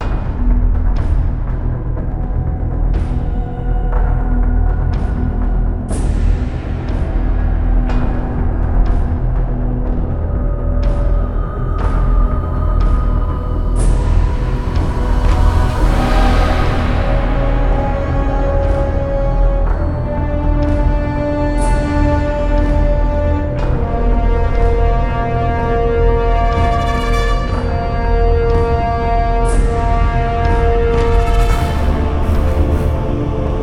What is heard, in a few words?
objective, music; Quake 4 Alpha